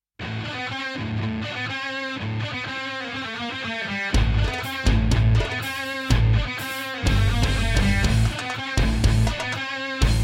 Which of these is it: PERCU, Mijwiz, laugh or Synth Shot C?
Mijwiz